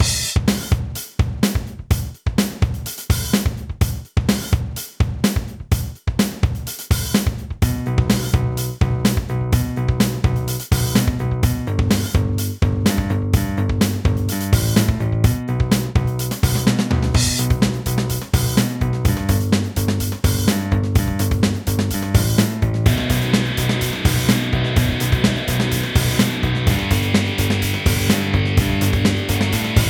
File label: Straight Rock